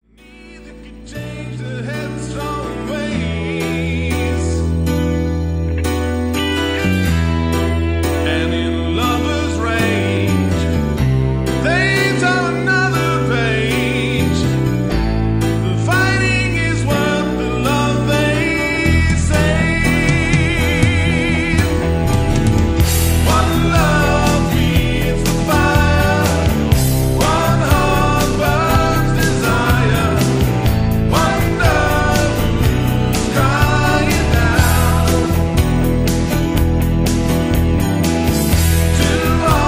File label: crying